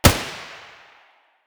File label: p90 muzzle mono distant, weapons